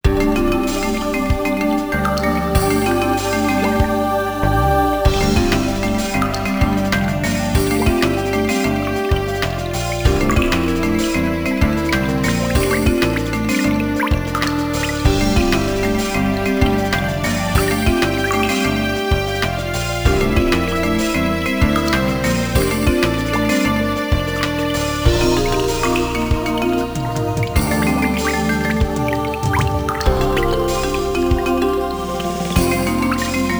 Spring Cave (With SFX); Dokapon Kingdom; Wii

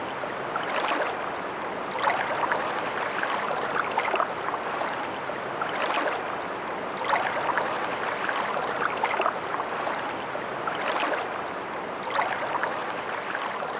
ENV St Loop; fishing resort family fishing 2011; wii

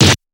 Kung Fu Fight Sounds

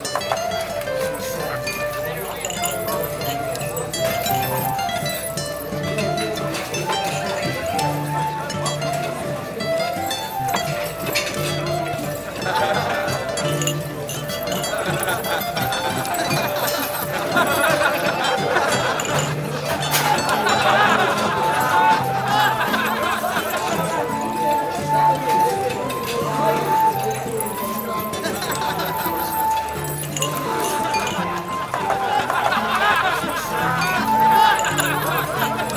Tavern; Fort Triumph

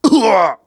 battle wound; Zote

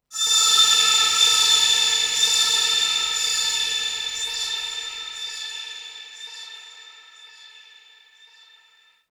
Oneshot Synth; zombie army 4 dead war ps4 stadia switch windows xbox one gamerip 2020

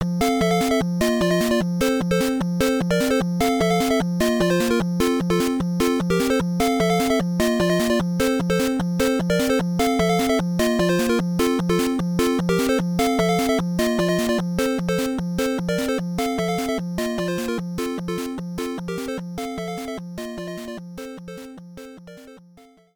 Continue Session; FamicomBox

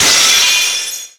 Force Break

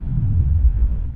portal; lego ninjago rise of the snakes ios gamerip

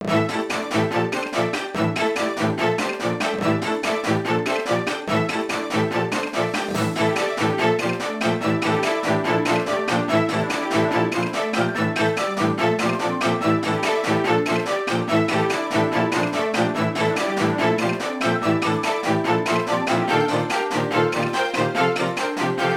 Battle - Upbeat; Sakura Samurai Art of the Sword; Wii; Castle

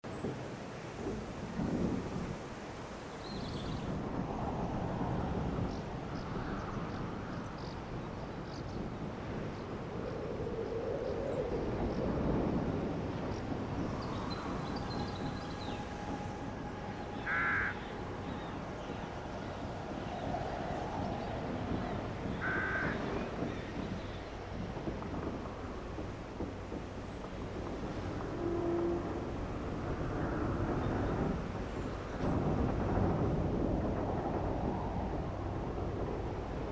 Birds Wind Breeze Village; AMB BED; The Unfinished Swan

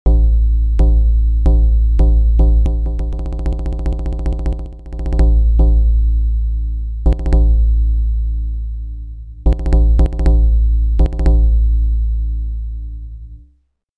Drum Roll

